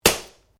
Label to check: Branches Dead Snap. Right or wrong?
Right